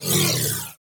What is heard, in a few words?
Crew ship Turret Proj; Warframe; RailJack; Corpus Crew Ship